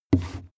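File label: wood step stairs, footsteps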